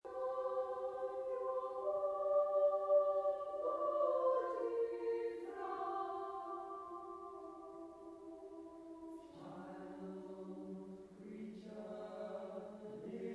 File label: piano practice